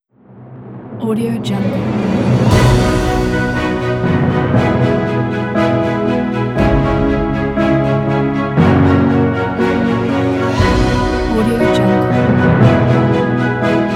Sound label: cinematic orchestra